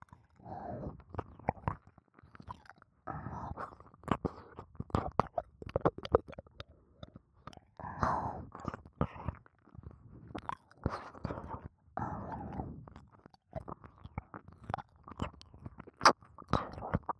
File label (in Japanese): 左耳舐めループ